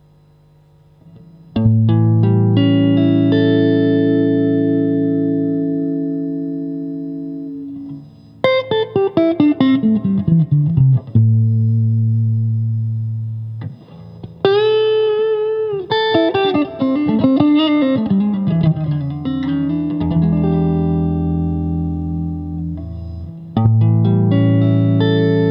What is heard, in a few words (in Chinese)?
50S Lite 国产铜线 清音 1档, acoustic guitar